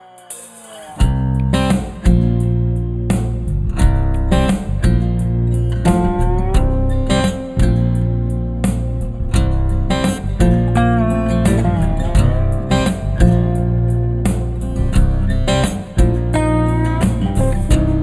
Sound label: 0.1ms delay